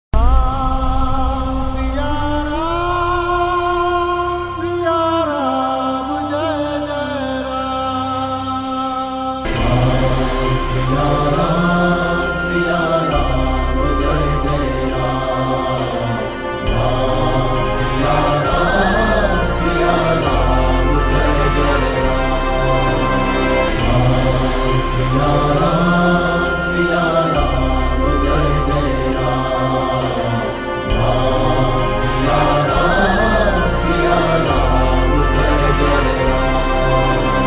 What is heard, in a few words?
Slow Version Hanuman Chalisa; Ram Shri ram; Ringtone